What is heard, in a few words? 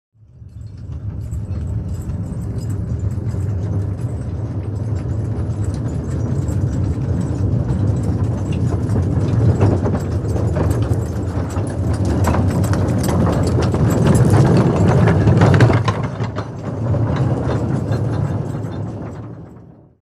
By Pit Pony Tub Up Past